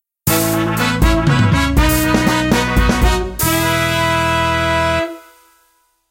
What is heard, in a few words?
Jingle Happy